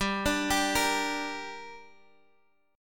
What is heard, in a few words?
G Minor G down Guitar Half Step Down, chord